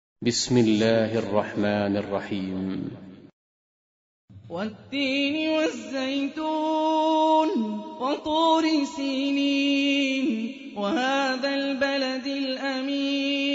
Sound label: Quran; Hani Refaey